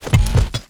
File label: biomech impact medium